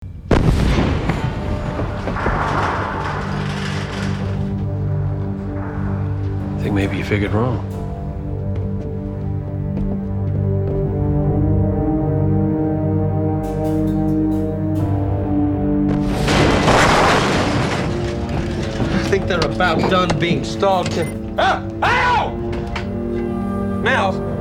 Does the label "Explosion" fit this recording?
Yes